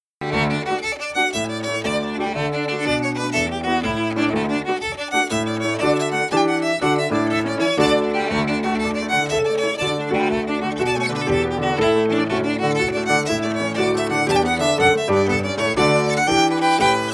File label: jig fiddle; east york jig; tune tutor; SeamusGrantFestival